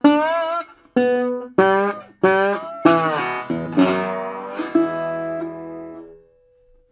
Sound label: Blues Guitar Samples; ILIO - Fretworks